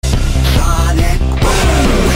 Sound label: sonic boom